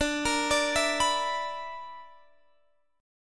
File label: B Minor Major 7th Double Flat 5th, chord